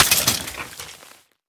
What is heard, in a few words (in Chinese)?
死亡声; 沼骸